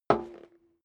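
step dress boots metal rattle single left, footsteps; schritte; menschen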